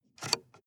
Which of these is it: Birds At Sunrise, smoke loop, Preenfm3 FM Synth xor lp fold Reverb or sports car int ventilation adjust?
sports car int ventilation adjust